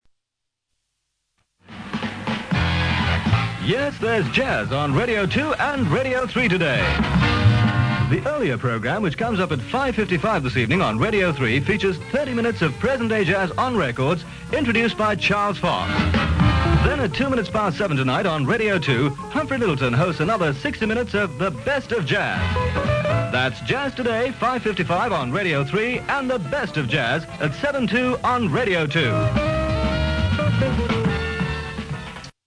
jazz; r2&r3

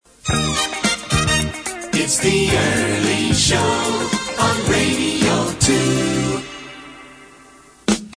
jingle

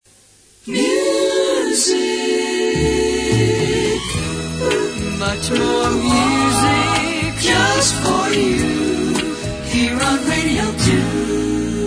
jingle

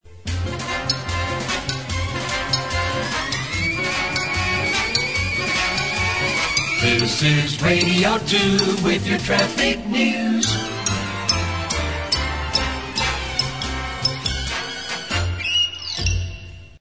jingle

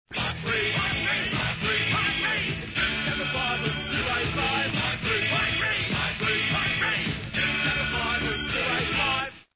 jingle; mike read

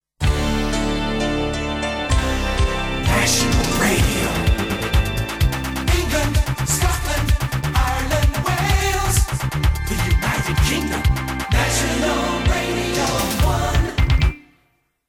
jingle; england scotland

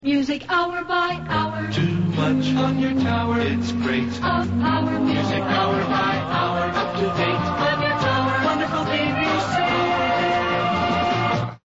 jingle music by hour

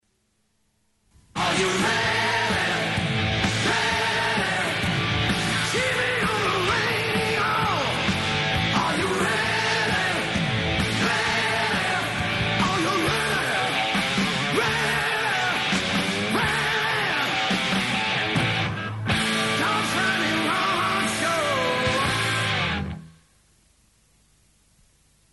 jingle; tv on radio

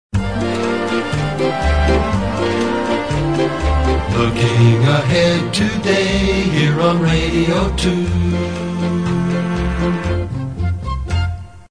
jingle

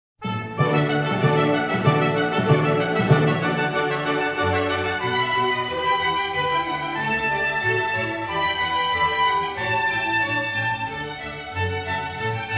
skipping tune

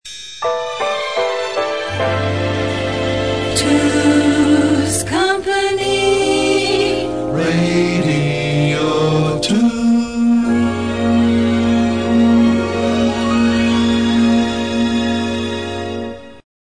jingle

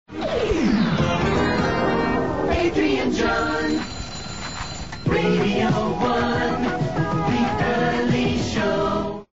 jingle; adrian john; the early show

